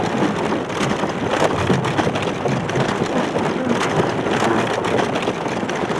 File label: War Chariot Loop; Civ4